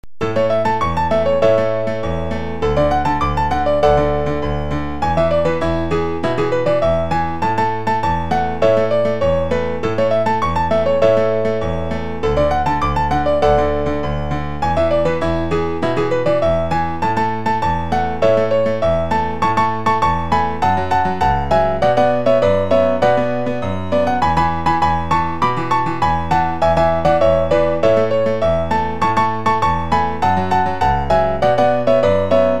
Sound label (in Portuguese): chorinho